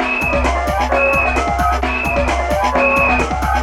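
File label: CARNAVAL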